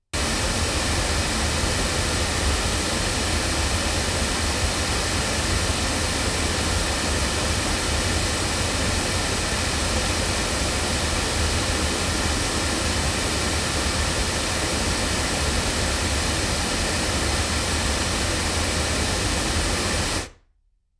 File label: Oktava MK219 loudspeakers